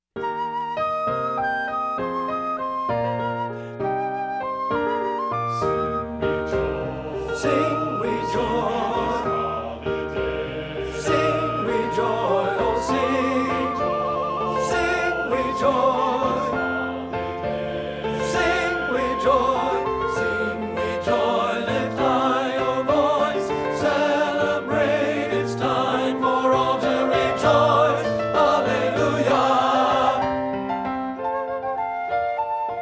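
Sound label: tenor; satb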